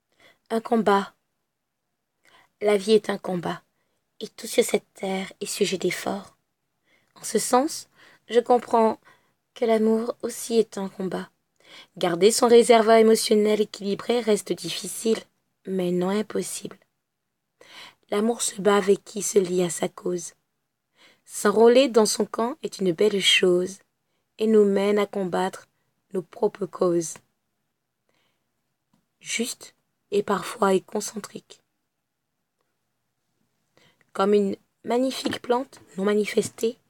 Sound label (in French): vocal